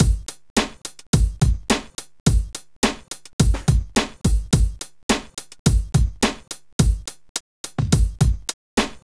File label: beat